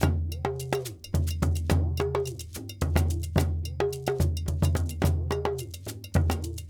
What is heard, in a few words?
Djoun, World Percussion; Donno, Bell, Shaker